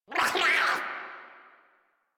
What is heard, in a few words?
Pain; Grineer Jester